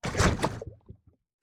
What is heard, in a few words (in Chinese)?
炽足兽熔岩上行走声